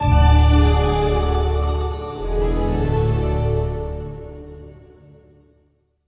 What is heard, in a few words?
intro Free Spin; fairyred